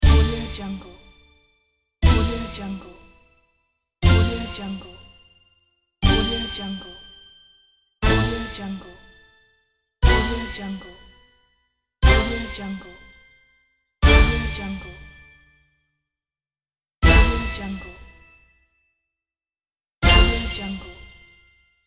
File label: rising bonus sounds; bassment55